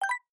home button click; my Buildings; City Verse Tycoon

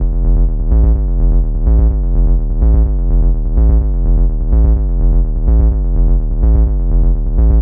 loop

